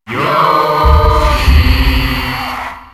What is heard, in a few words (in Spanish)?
Grito del público; Yoshi; SSBM